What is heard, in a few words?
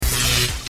eletricity